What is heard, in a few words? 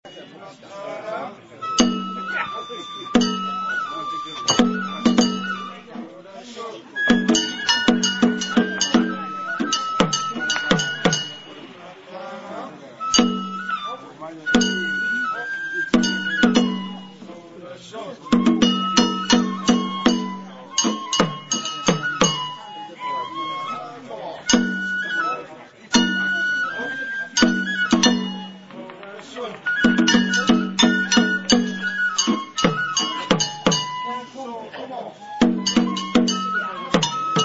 banjo